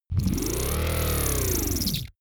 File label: servo rubber rev up